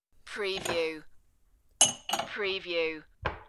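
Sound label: Stack Glass Light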